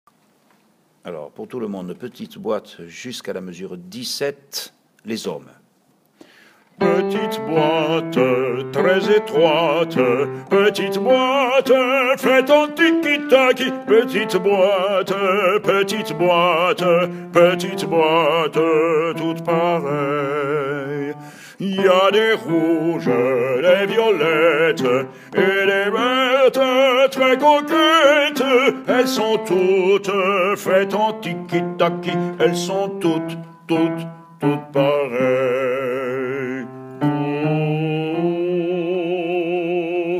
hommes

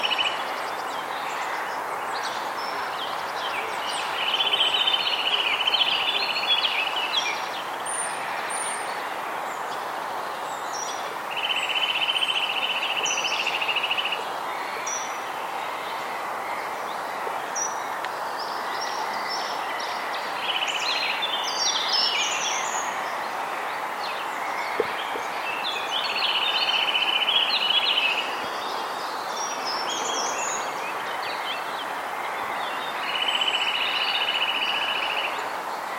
sittelle torchepot

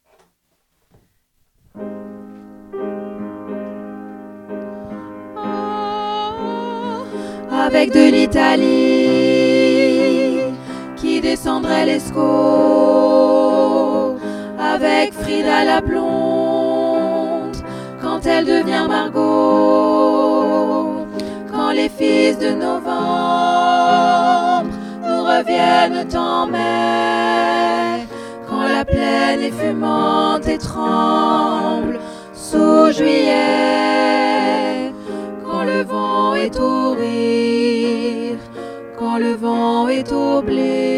tutti

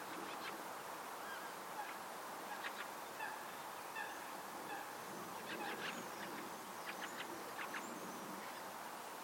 grives litornes en vol

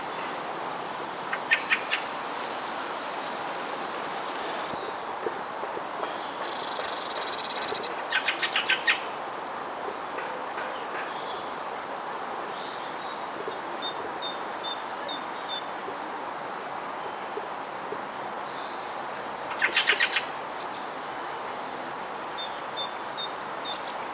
grive litorne; haute ile

